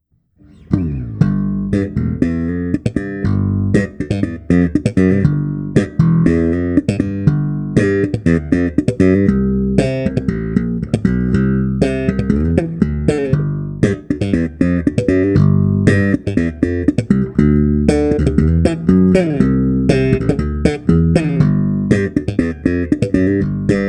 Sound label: SLAP; peluso